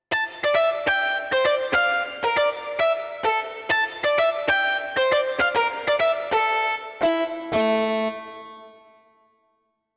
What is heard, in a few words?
Guitar Pinch